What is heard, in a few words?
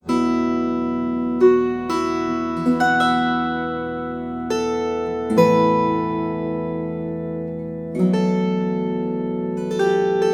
WIRE Celtic, harp